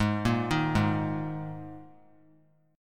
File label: G sharp Suspended 2nd, G sharp, down; G#sus2; arpeggiated; JGuitar